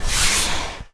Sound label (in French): Couvercle In